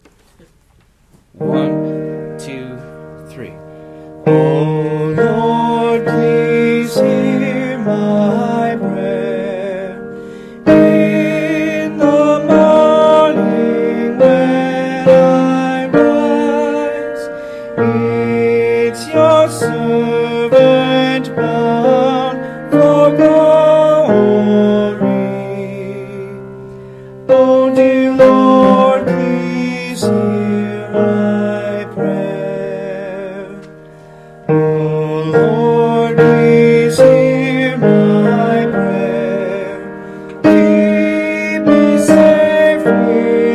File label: Bass; Bass I